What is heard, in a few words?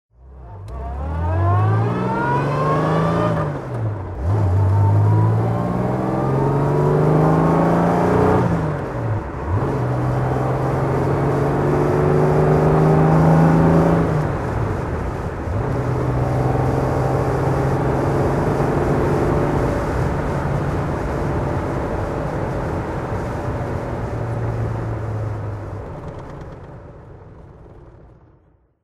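jaguar etype ots driving, engine sound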